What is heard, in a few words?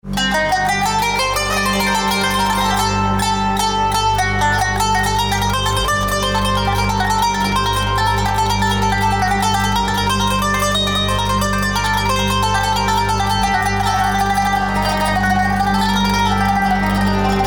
QANUN Audio